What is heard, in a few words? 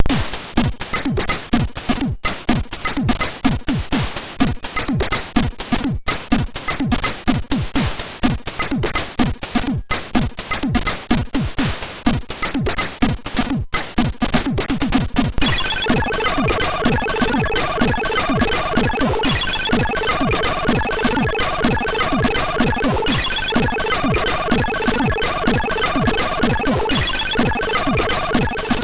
Bass and Drum, sid MOS6581R3; hvsc